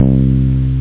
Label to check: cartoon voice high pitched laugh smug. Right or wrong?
Wrong